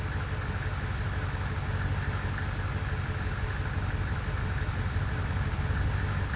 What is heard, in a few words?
crashed truck; cstrike